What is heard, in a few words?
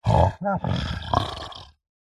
horror monster playful curious caring grunts groans